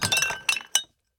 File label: Jar Dealer Attack Underlay